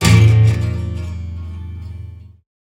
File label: guitar ac fx, Ac Guitar FX; 3maze